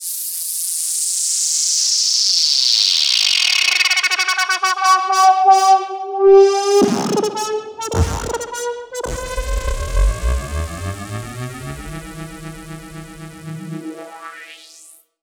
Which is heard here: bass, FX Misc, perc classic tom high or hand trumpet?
FX Misc